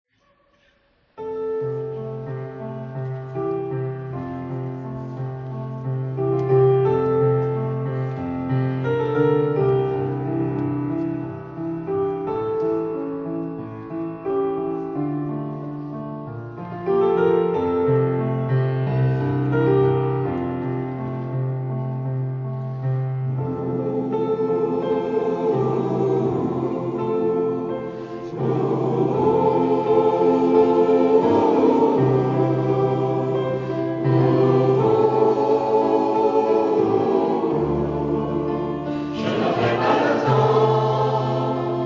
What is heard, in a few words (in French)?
chorale; col canto